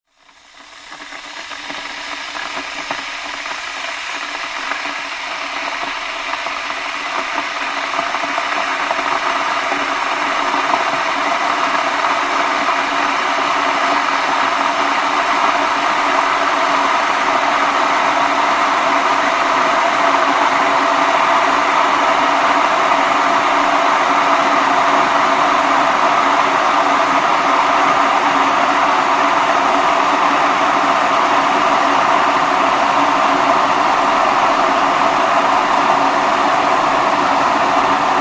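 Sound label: sound of kettle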